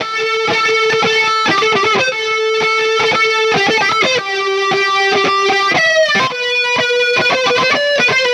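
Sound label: A Phrase Lead Guitar Melody; Zero-G; Future Wave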